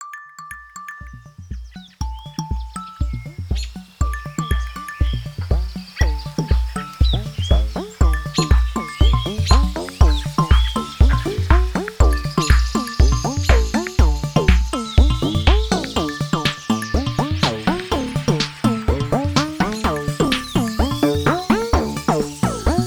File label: Forest beat